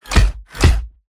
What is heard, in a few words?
icebreaker move